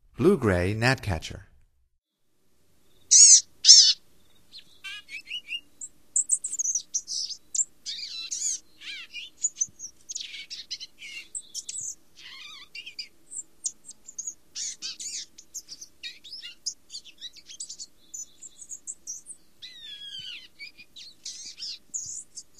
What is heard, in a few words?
Blue-gray Gnatcatcher, Songs of Birds of North America; Stokes